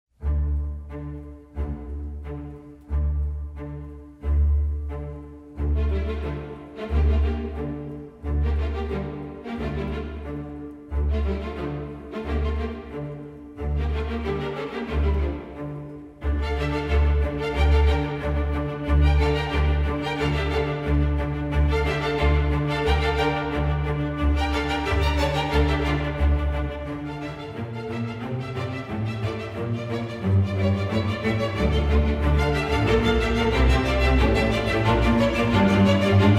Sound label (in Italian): archi; Sonora vol B